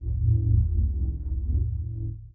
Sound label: magic; smite; ahpuch skin10